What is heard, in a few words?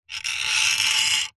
industrial metal lever pump squeaky creaky single very slow action; zapsplat